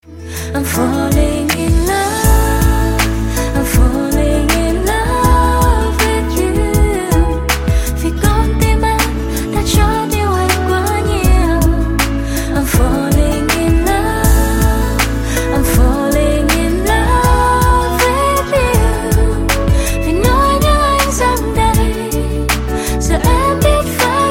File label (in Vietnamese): Nhac Chuong Cam Nang